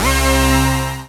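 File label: synthesizer Strange; Best Service Gigapack 1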